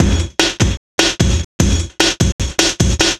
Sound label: Beat, Drum N Bass